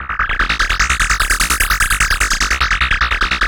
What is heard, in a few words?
Analog Rhythm; E-MU Producer Series Vol. 6 – Analogia Project (CD 1)